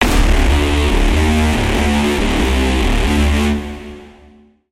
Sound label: Braam